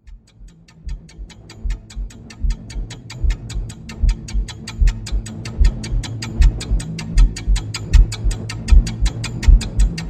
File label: collapse tension, ui